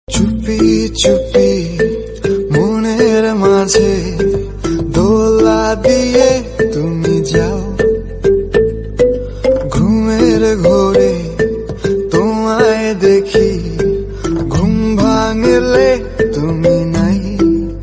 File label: Bengali